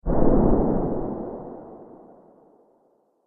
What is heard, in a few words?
Dinosaur Mighty Footstep